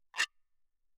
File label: guiro, away, ff; Percussion; hand percussion